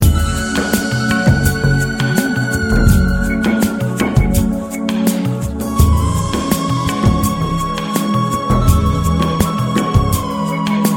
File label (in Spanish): Beautiful Ambiental